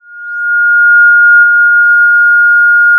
Sound of a police car siren

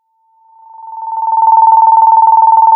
Sound of a phone ringing

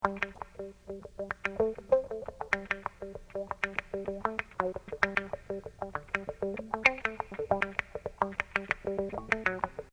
loop kicking back

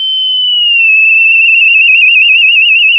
pop, sound of a tea kettle whistling

